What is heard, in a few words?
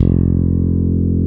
TITE BS F2, TITE BASS; Drums In Your FACE!